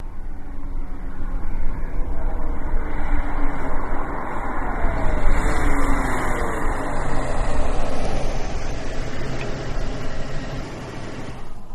Mustang In Fast, Stop, Off

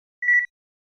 Flash Beep HF Stagger, flash beep

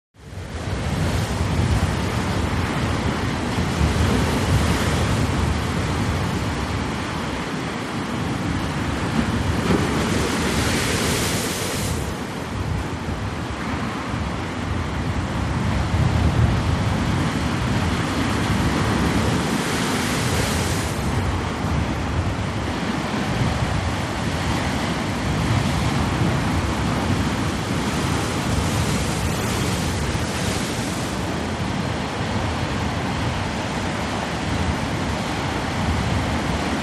Storm Waves Storm Bre, waves

